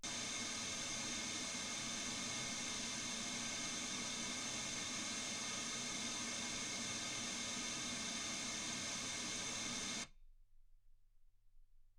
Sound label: wgn